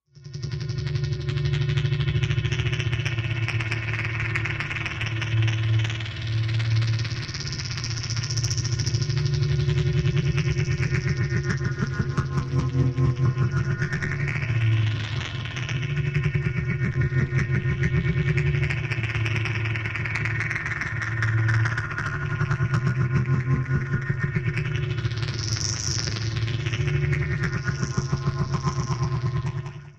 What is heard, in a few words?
Modular Vibrator, energy beams